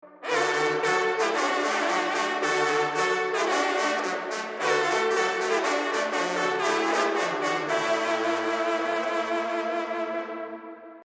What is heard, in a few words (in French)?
cor de chasse